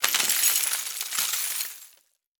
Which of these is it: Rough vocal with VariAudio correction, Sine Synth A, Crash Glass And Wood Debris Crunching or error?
Crash Glass And Wood Debris Crunching